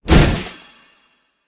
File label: death poof; Smite; Sobek